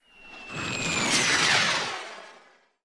grenade throw

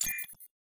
enemy level up

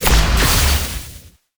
skill sword rain

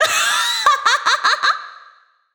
ui load wolf laugh

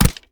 night arrow

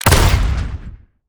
atk grenade

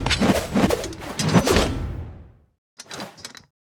swo skill whoosh

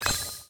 ui purchase

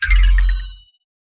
ui battleover normal

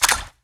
water bubble

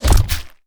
atk throw sabre

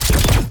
energy gun

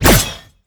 firesword

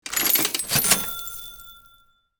ui coin all

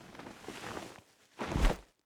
cloak movement

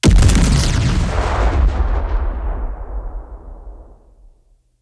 explo car

